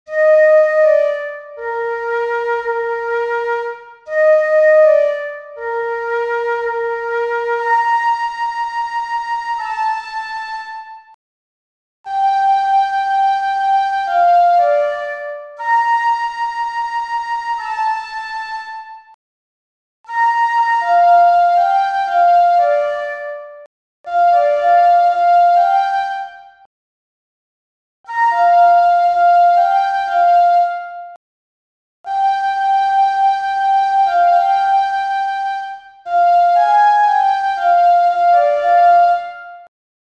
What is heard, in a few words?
flute